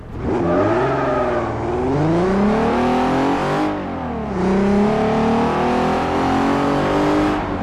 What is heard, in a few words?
first, vehicles; c32; TDMCarpack; TDMCars